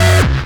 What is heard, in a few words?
Synth Shots; Vengeance Samples